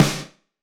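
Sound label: Snare Drum Acoustic Verb Short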